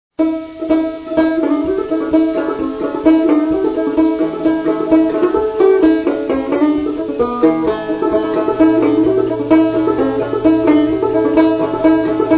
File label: banjo